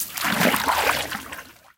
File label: deep water step; Golden Crayon Sounds